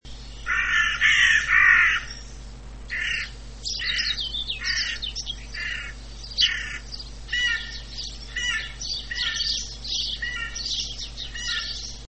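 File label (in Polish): wrona, krukowate - corvidae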